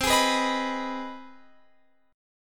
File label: C Minor Major 7th Sharp 5th C Guitar Open F; CmM7#5 strummed; JGuitar